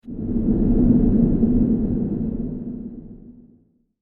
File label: Graveyard Rumble; horror; Ghost Sound Effects